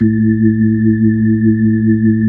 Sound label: B-3, chorus; Br. Ch. Xfade